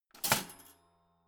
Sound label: toaster finished jump out